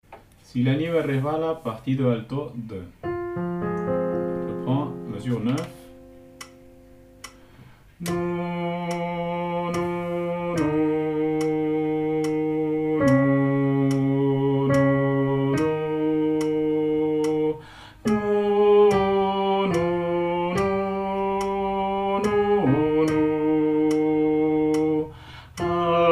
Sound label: Alto